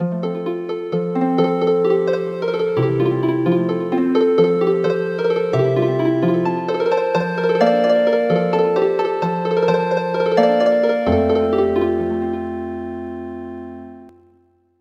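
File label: Healing Harp, traditional; The Riera Heir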